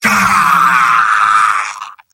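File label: sniper pain critical death; vo; tf2; mvm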